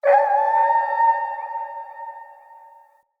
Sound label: Wolf Cub Trapped Call Distant; Animals; Wolf Cubs; Orengal Wolf Cub B